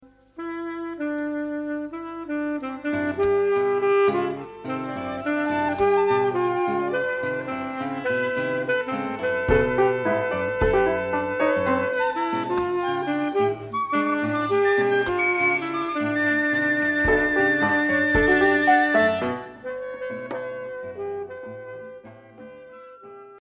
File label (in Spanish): Sax Sin Aliento